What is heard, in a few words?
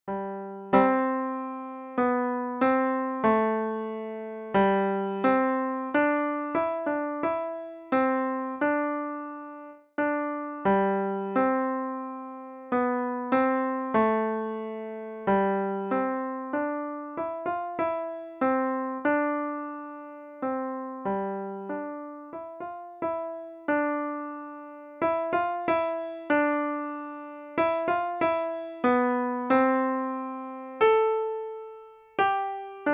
chorale violin midi